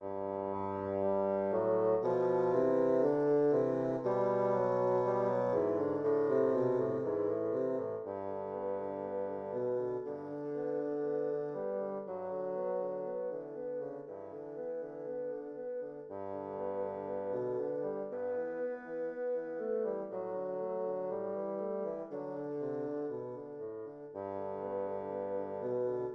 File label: study, 2 bsns; J.J. Friedrich Dotzauer